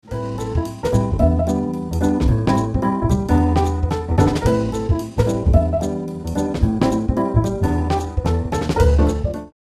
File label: Fast Jazz, guitar